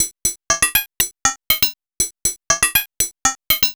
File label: SERGE; AMG - Vince Clarke Lucky Bastard